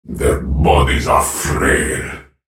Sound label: attack; Viktor; Character Voices - English; en US